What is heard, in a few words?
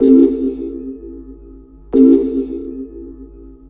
Synth, Techno